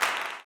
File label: CLAP, Blues Soul; Spectrasonics Vocal Planet